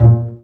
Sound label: BA PIZZ, Orchestra